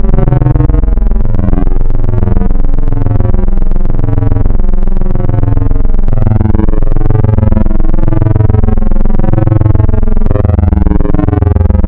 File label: Spectral, wavetables; Architecture 32